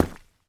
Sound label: step; step on regular ground